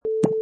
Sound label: Blip